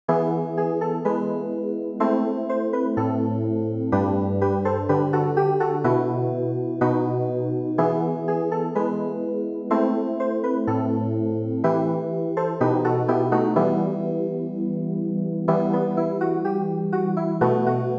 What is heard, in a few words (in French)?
Clavier